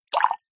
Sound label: drink small measure spirit pour into shot glass